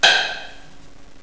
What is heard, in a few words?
clap response